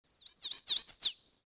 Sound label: solo bird, colombia; ambience; Soldier Of Fortune II Double Helix; XBOX